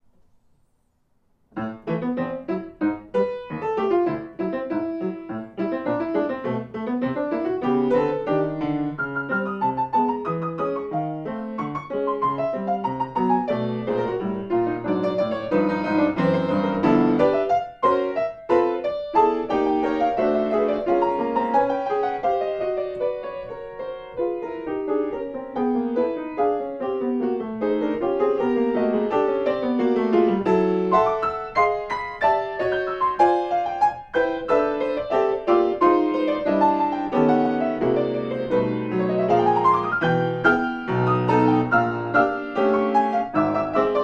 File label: Polka